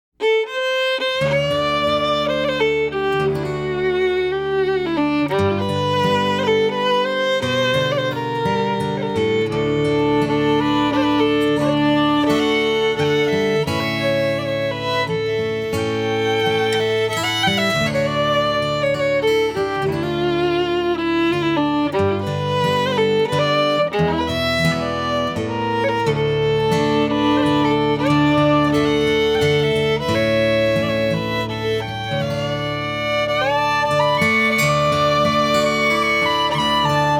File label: Waltz